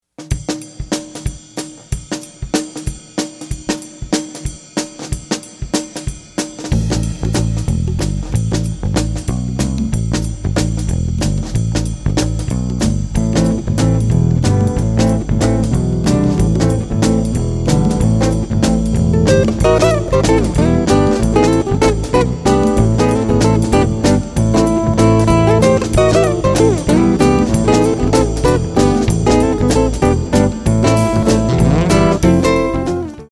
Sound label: jazz